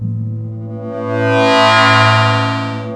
ATMO FLY, Brass Wind, POP BRASS; AKAI S6000 CD-ROM - Volume 1

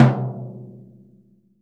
P.MTOM MP, VELO TOM

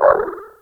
Dog Hats; Yamaha DTXpress; Effect 1

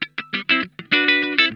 SLINKIN, Funk Guitar; Zero G - Funk Guitar

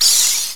Crowd; PGA European Tour

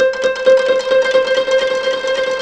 KOTO C3

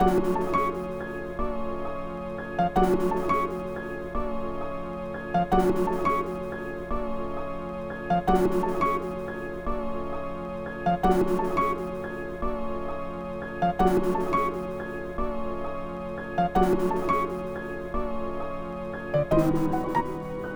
Melody

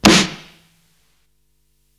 Snare, Psychedelic Drums

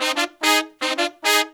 Pop & Funk Brass

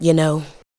ADLIBS

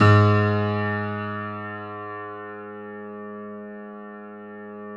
G#1 YAMAHA, GRAND; NS GRAND

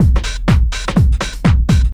Kit Loops, Techno Trance

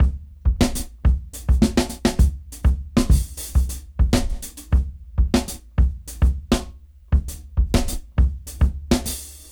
Jazz Dry 99 BPM; AMG - Keith LeBlanc Phat Butt Jams

